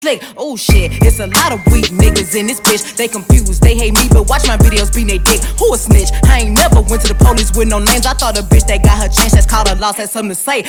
shots fired

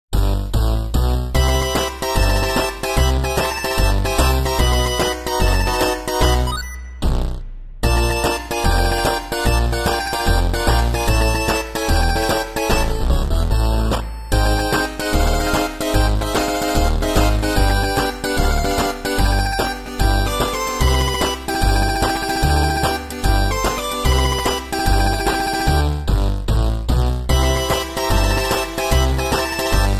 bass

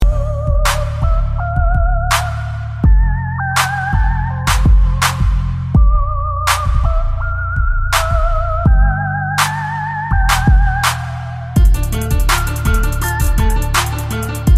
bass

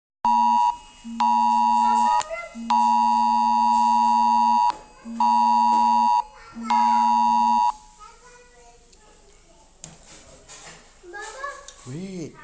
coronavirus warning

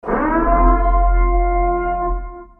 siren sms; purge

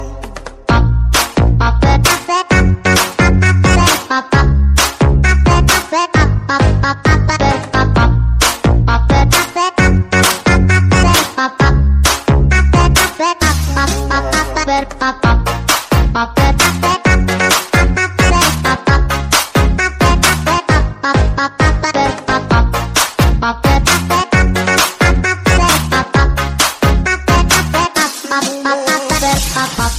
remix

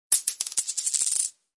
coin

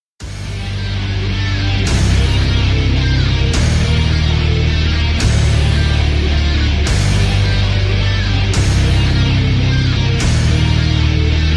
epic anime valse

